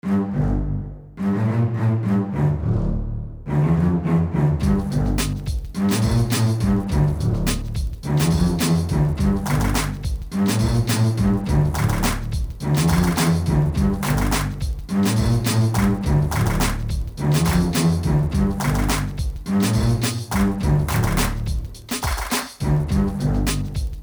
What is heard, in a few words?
Instrumental HipHop